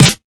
00s subtle reverb steel snare drum sample f key, steel snare